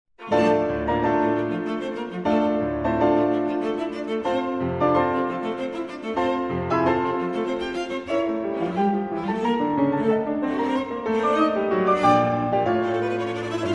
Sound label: piano trios; russian piano trios; arensky